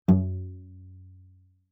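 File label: Cello pizz fortissimo sul C Gb2; MIS Pitches - 2014; Strings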